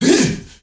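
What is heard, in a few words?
MALE HEHA, SHOUT; Spectrasonics; Heart of Africa 1; CH. SHOUT2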